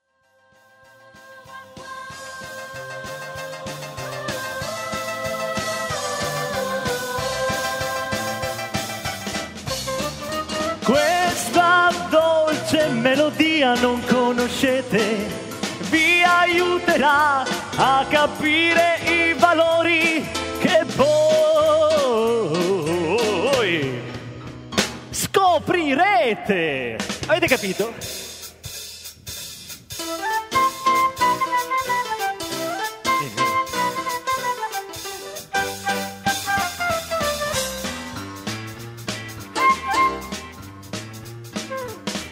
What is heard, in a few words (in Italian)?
Melodia